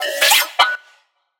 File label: Synth; 7rystan's 700+ FREE COLOSSAL EDM SAMPLE PACK; SFX